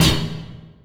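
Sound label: Bleuy Oneshot, beats; Sonic Foundry (Sony Creative Software); Esoterik Beatz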